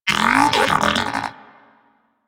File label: Moa Pet agree; Warframe; Pets; Tenacious